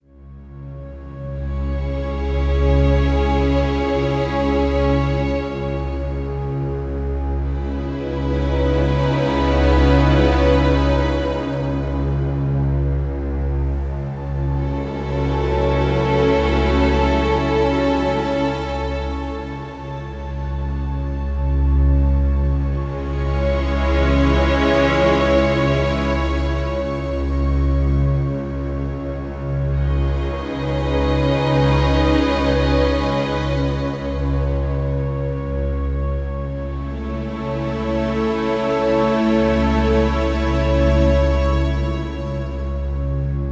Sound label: Death Stinger; Battlefield 1